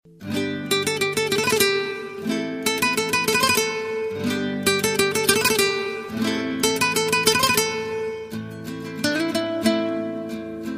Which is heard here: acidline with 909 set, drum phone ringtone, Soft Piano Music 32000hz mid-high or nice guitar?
nice guitar